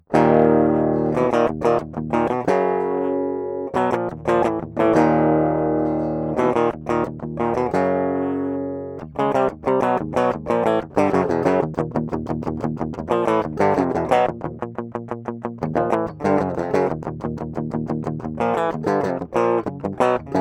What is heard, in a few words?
Guitar DI Track